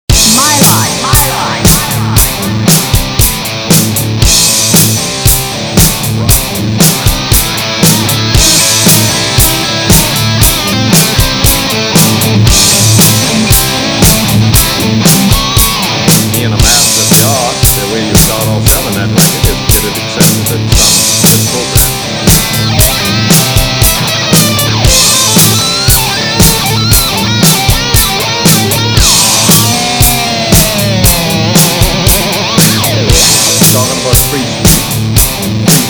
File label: Rock Music